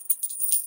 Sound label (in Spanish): monedas